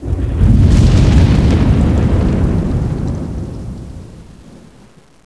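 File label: Ambience Thunder; Kelly Slater Pro Surfer; macOS, Windows gamerip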